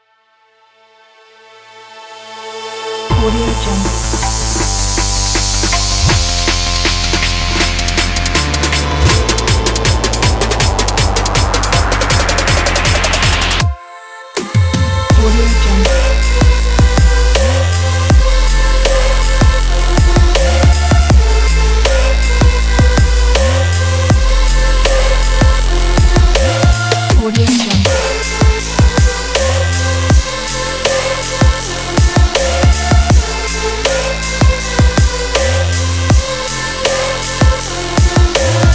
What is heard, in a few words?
future vocal dubstep